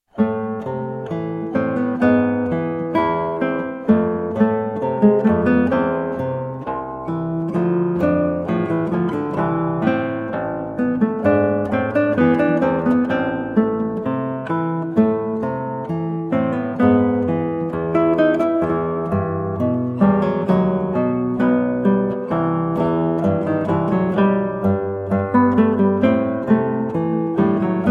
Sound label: Andante, guitar, spoken; Sonatina Quinta TWV 41 A4; Georg Philip Telemann